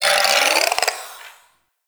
Vocal Idle; Warframe; Duviri; Shadow

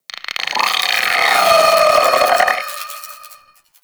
Sentient Brachiolyst Idle; Warframe

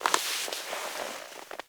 Classroom Move Foley; Ambience; Warframe; TheNewWar; Zariman

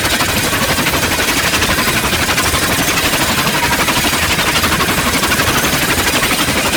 Stalker Parrying Loop

